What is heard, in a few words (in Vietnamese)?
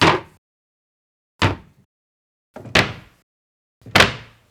Tieng keo tha cua tu bang go